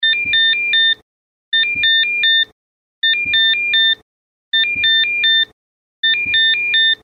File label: alarm loop; norden-sounds